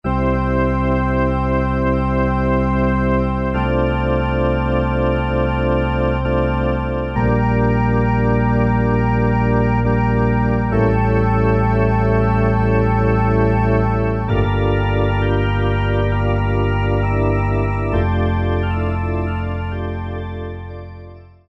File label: church organ, Technics SX-K450